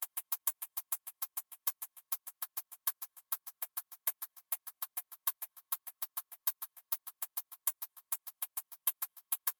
Music Tension

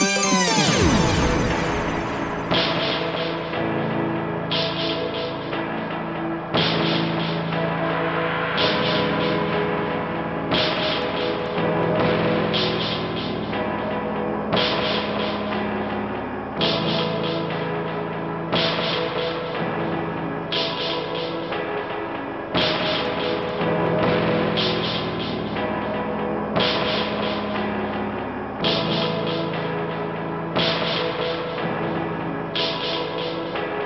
Jingle slow; Blinx 2 Masters of Time and Space; Xbox

